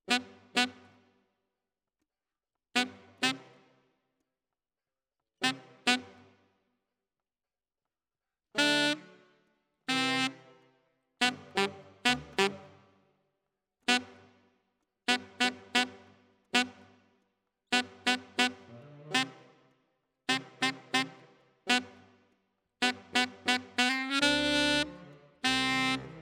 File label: Alto, RNR for piano